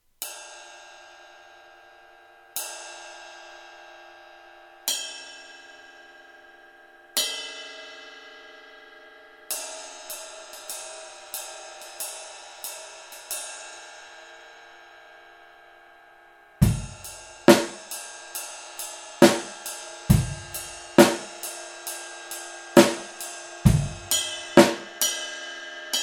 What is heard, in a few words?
Ride 21; ride bekken; Antique; Amedia